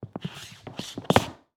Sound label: Foley Footstep Cowboy Boots Slide On Hardwood Floor; Foley Footsteps